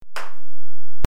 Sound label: Face Slap